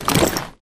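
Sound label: grunt movement foley loud medium; Titanfall 2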